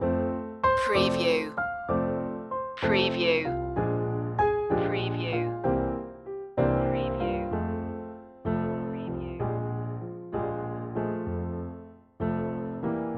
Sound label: bluesy town loop